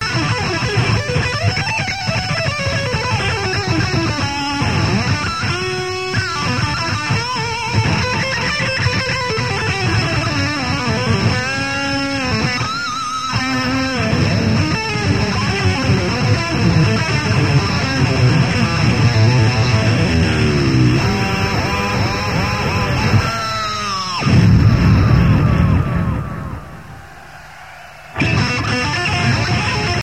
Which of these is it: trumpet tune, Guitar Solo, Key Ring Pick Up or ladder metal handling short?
Guitar Solo